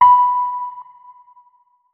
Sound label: notes, keyboard, electric piano